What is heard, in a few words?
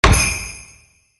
staff slam; rayfa